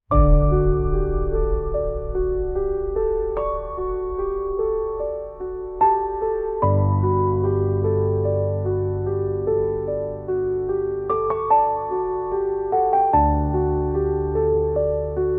74 bpm Nature Awakening; 78 sec; ai social media music

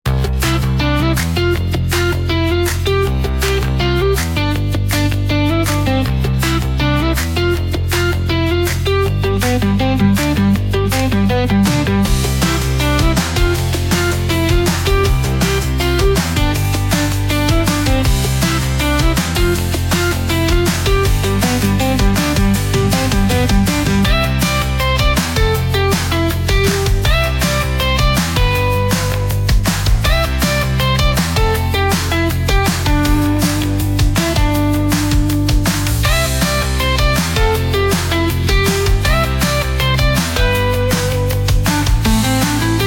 160 bpm Guitar Handclaps Melody, instrumental; 73 sec; ai social media music

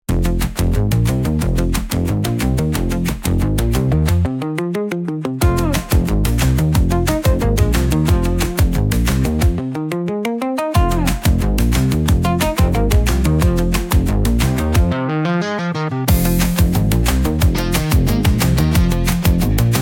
90 bpm Synth Bass Guitar Rhythm; 58 sec; ai social media music

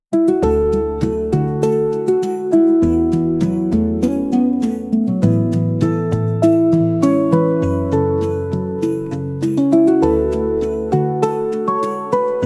100 bpm Handpan Nature Christmas; 49 sec; ai social media music

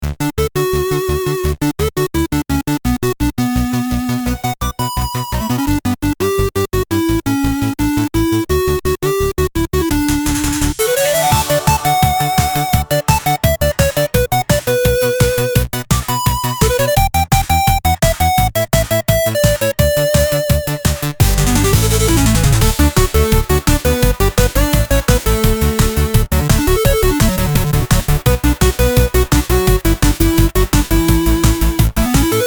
8 Bit Stereo Gaming Sound; ai social media music

